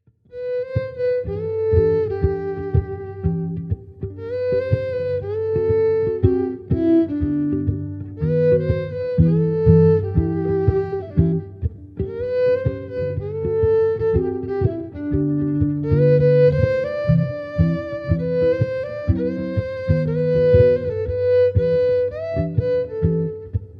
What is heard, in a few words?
Electric Violin